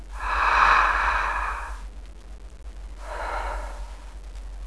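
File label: deep breath, People; Sound Library 2000; Wayzata Technology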